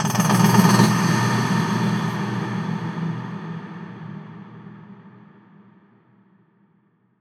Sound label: Atonal Depths, Psytrance; Ghosthack; Psytrance Visions Volume 2